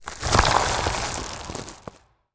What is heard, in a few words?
atk bind